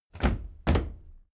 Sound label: step military march stop